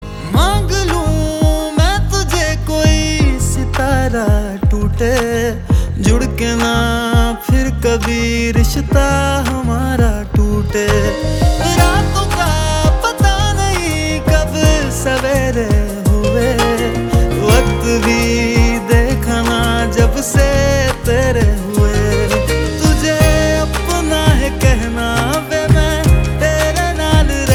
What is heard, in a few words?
Ringtone